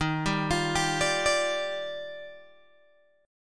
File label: D sharp Major 9th, Guitar Open G